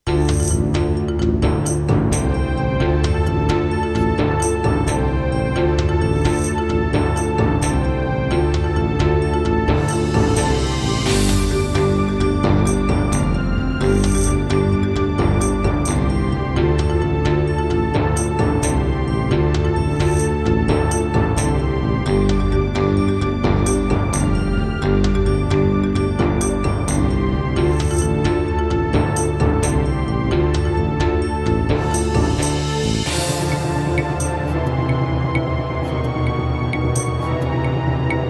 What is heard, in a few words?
Roland SC-8850; Heretic